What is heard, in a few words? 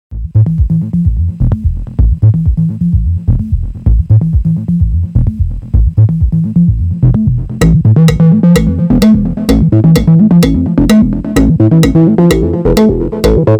cowbell; MIDIbox CV